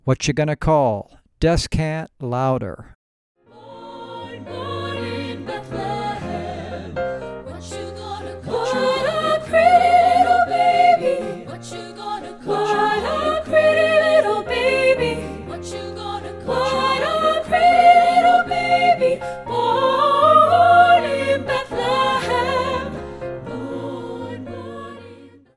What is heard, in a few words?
DESCANT LOUDER; practice tracks